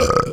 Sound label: belch